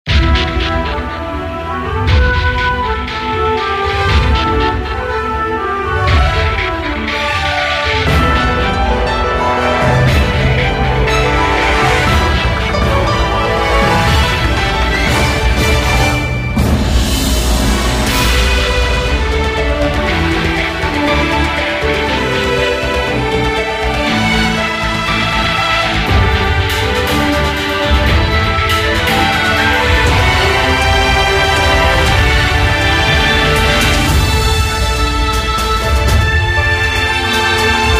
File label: Battle BGM